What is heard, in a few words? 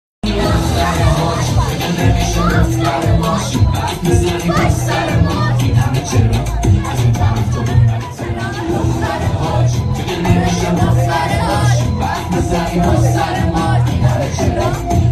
party sound